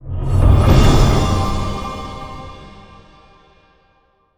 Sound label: bonus Win; netent